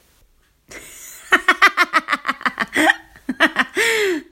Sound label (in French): Joie rire